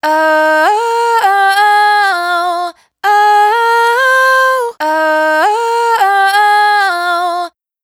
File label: riff Oh Woah Fm, Dry Vocals; Raw Vocals; Slate Digital Omnivox Vocal Sample Pack